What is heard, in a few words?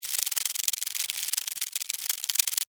Millipede Walk, Millipede, Walk, Movement, Insect, Bug; Millipedes; Animals:Insects; Blastwave